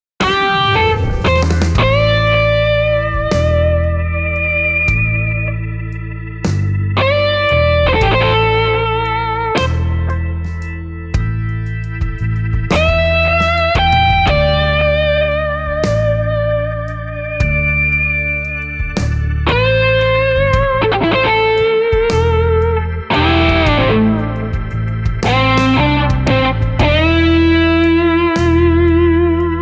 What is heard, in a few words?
Real bluesy